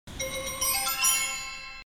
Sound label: Ringtone